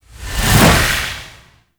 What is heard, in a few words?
Slide Swing, Melee; Warframe; Weapons; Duviri; Incarnon Adapters